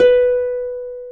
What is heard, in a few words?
HARP B4; AKAI S-Series CD-ROM Sound Library VOL-2